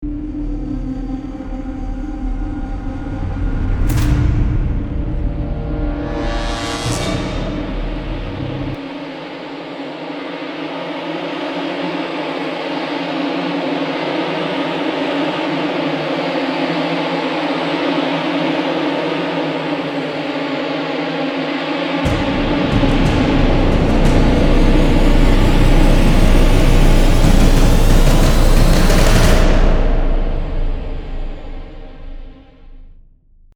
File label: multipressor before